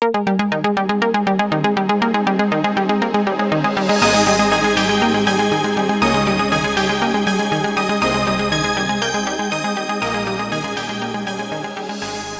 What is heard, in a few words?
intro music